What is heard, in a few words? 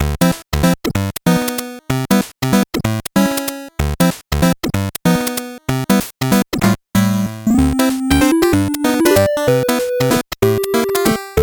8bit bgm normal